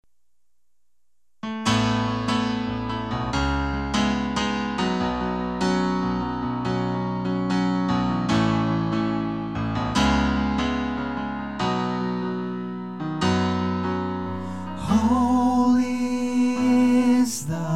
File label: God is Great Bridge (Tenor Lead Left), vocal helps; worship